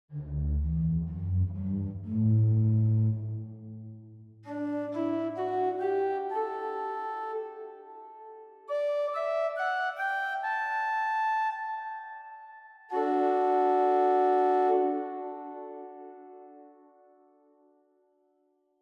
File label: Praestant 8; Zwolle